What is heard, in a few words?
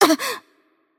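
Brazilian Portuguese HurtLight; bloom minigame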